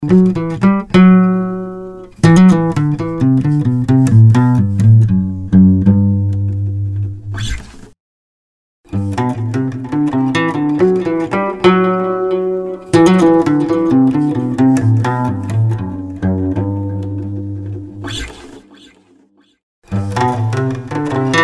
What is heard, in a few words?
AcGuitar; RingX